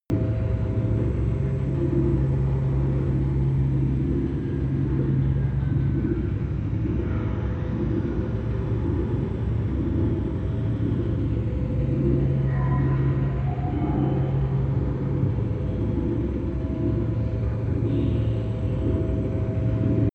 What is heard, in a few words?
space station ambience loop, Science Fiction; atmosphaeren umwelt